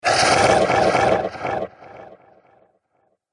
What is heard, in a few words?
Crazy Chomp Monster; Monster Sound Effects; Andrew Fleming